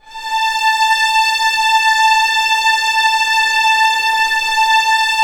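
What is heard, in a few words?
STR A4 FF, Orchestra Strings; Sound On Sound - Peter Siedleczek's Orchestra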